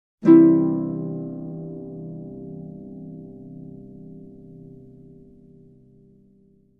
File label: Mellow happy chord strum